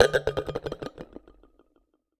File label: Base Wobble, Medium Wine Glass